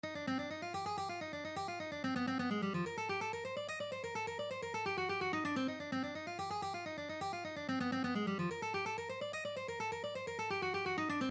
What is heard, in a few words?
Alternate Picking Exercise, guitar